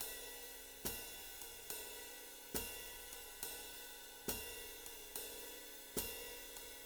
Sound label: JZ70 SWING, Living Drums